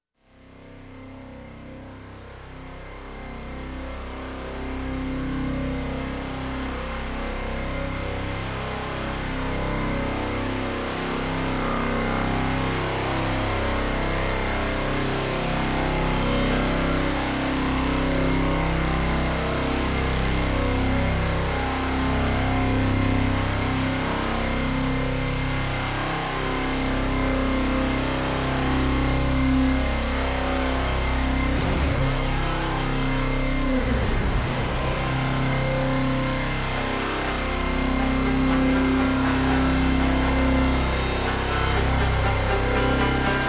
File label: noise